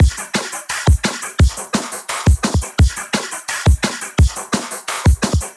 172 Fx Drums

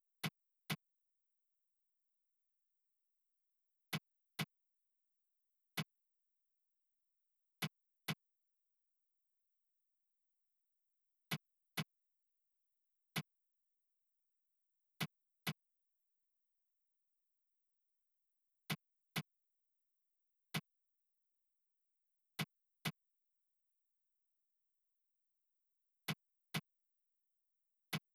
Snare

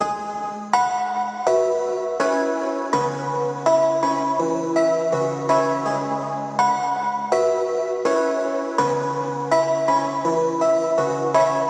Melody Loop Piano G 82 BPM LoFi Ambient

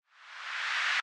Noise, Hit, Filtered

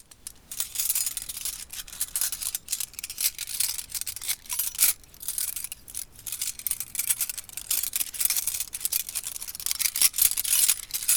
foley metal

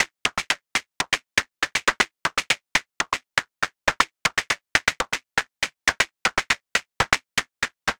Analog Techno Sequence 120BPM Analog Machine

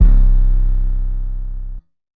Processed Spinz

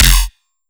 BASS SHOT F; KULTURE; JUMPUP

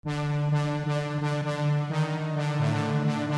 BRASS

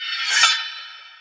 Sword Scrape FX

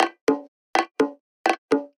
120 Bongo Fill

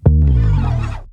bass slide, Eb

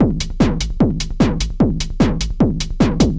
Beat Loop 150bpm

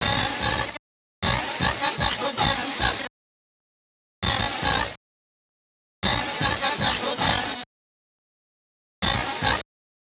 beat loop, 100

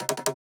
PERC

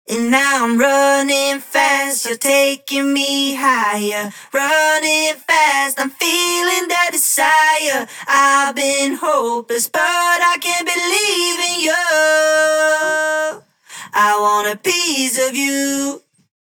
Break Main Vocal Chorus doubles dry 128 A minor

